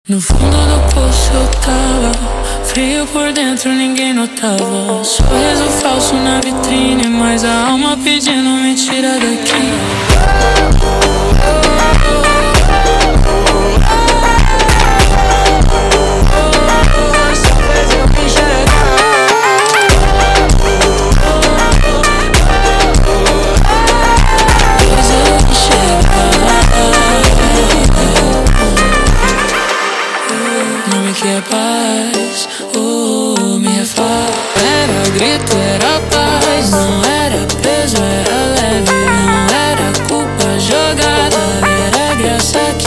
slowed, funk

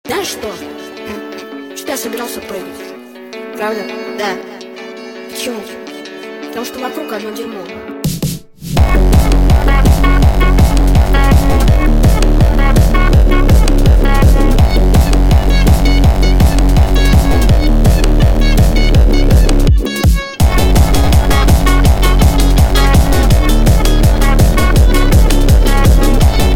hardstyle Speed Up

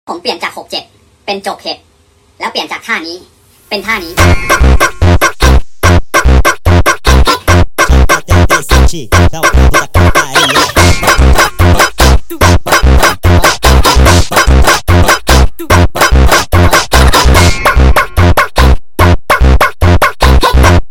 Funk sped Up

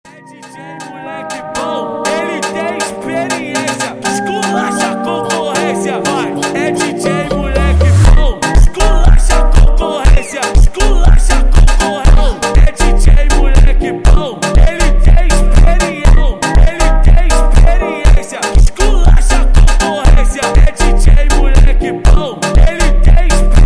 Funk slowed

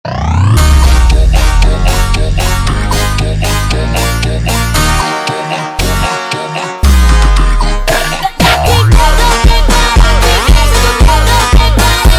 Funk slowed, funk

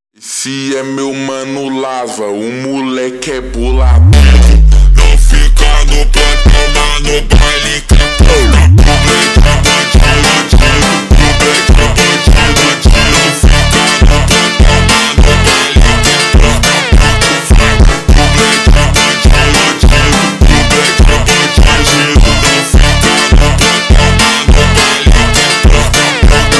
Funk super Slowed, funk

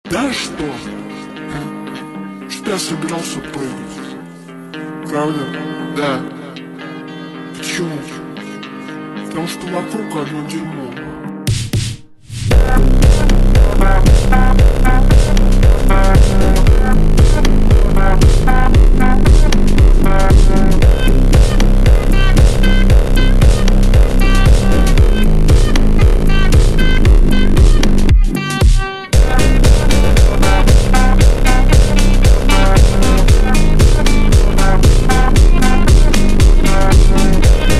hardstyle Super Slowed

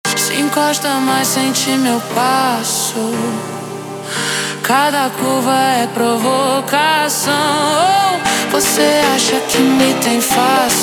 slowed, فانک; funk

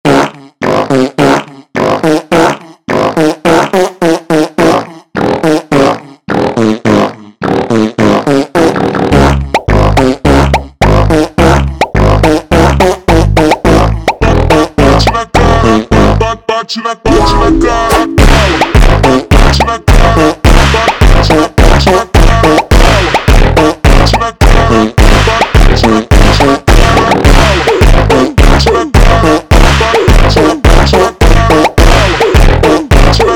Fart Funk super Slowed, funk

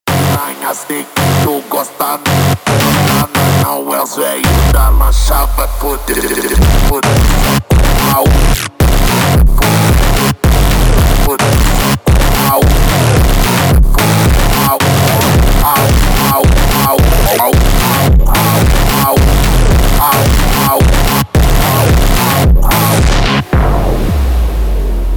funk

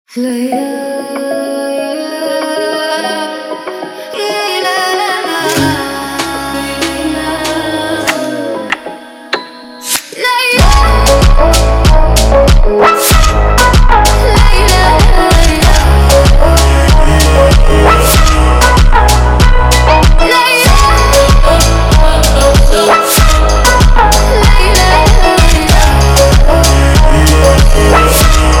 sped Up, funk

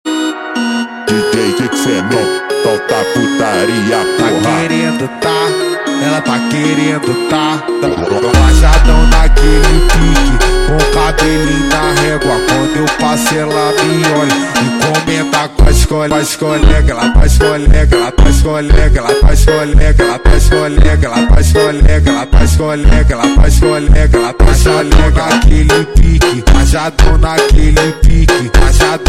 Funk slowed

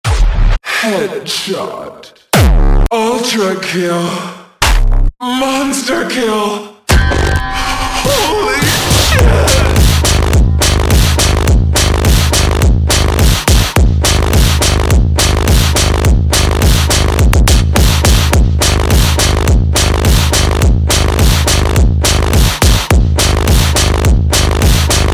Kick Selector slowed

